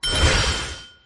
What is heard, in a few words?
Click